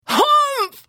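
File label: Hit, Khajiit female; The Elder Scrolls III - Morrowind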